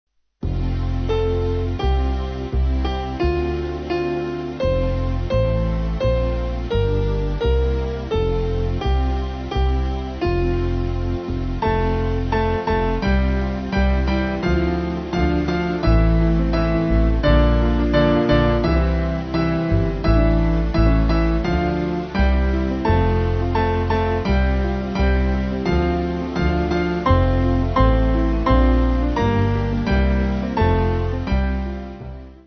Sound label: Band, Slower; Now Unto Him; Mortishire